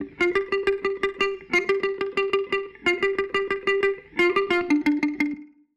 Guitar line, 90bpm, hiphop; musicradar

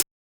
DnB Hat; drumnbass 170bpm; Drum Kits; musicradar; Drum Hits A

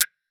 Tech Drum Hit, techno 120bpm

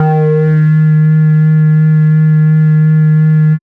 Bass B D#3, drumnbass 170bpm; musicradar; Bass B Multis

